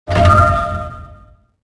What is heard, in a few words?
secret area